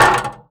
vent grab, headcrab classic; headcrab classic, scripted; creatures

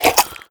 breath in, headcrab black; creatures

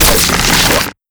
electric grab, battery holder; mechanical units

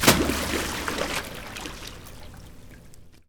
panel splash water; Portal 2

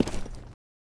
step test loop

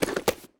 armor pickup; Counter Strike Model Pack; CS:GO; survival

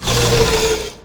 ramp lower, plats; Portal 2

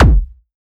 mg lfe, weapons; combine; Half Life Alyx

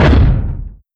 step roof; creatures; strider; seq passby

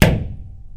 drywall impact hard, plaster; Half Life 2

